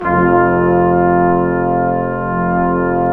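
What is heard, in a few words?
BRS QUINT, brass quintet; Roland L-CD702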